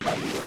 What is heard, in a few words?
jump; Ben 10 - Hero Time Game; xlr8